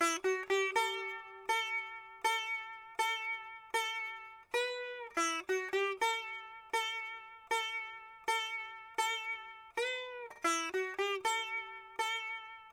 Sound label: sitar